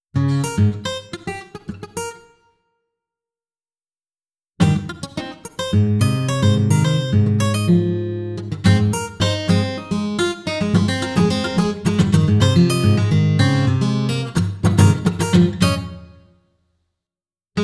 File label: e5 guitar